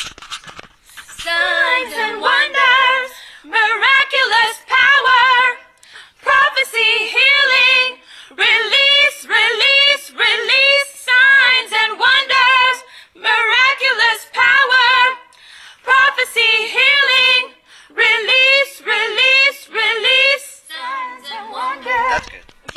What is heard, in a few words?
soprano